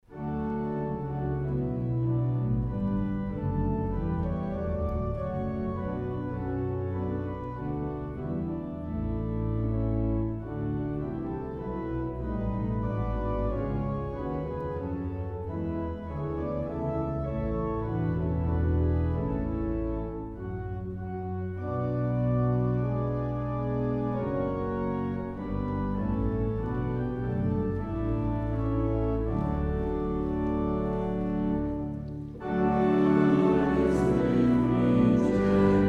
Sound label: hymn